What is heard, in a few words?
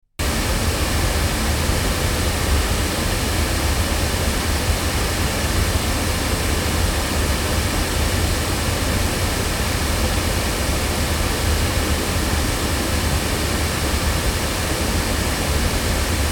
Sontronics Omega loudspeakers